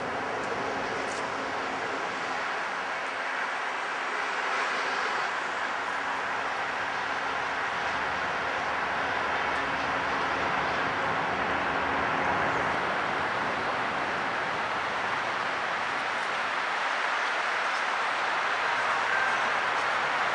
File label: Cooper's Hawk